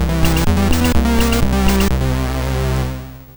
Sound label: T-Rex 64, MOS6581R2